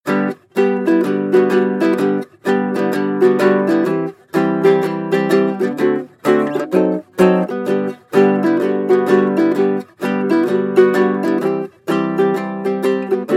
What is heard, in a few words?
baritone ukulele strumming sequence; loops